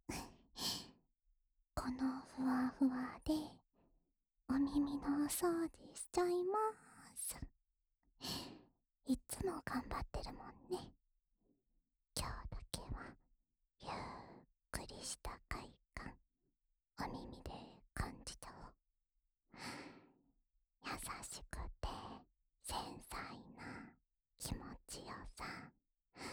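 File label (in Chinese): 安眠用梵天掏耳迴圈（左耳）, ASMR; 莉莉亞; 恋愛サポーター・青春べろちゅー委員会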